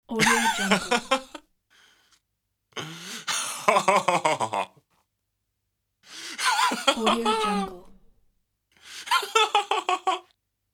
male laugh

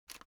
object headphone extend single short

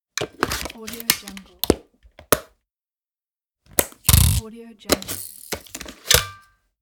dslr camera battery

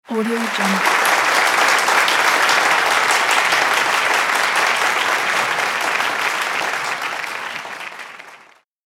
applause in theater

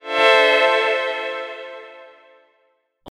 ambient game ui notification synth level complete; esmteam

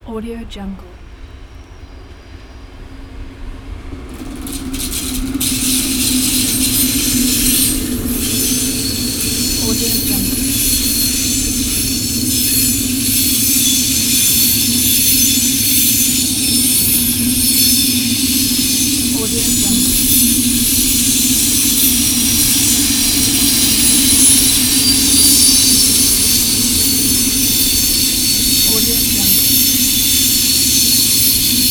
noisy train passing; miuziqa

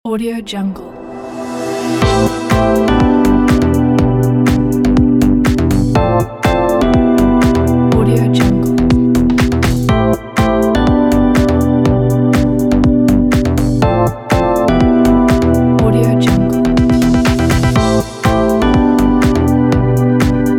fashion electronic

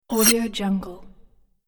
swipe attract movement

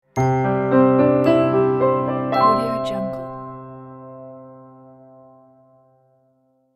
beautiful piano logo

